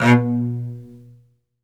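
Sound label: SOLO cello, Strings; ILIO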